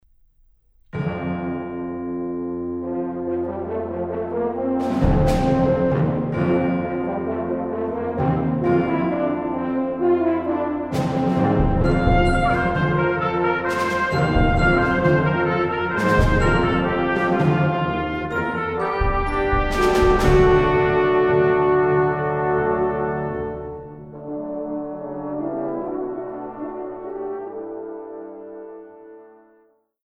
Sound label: Declamation on a Hymn Tune